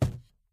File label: phys cube, physics